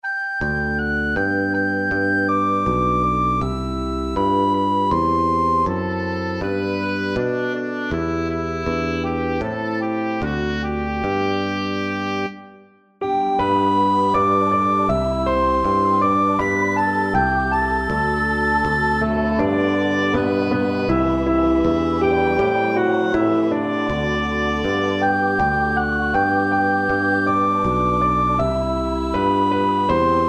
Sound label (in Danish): Orgel Klaver Bas Sangere G dur Syng med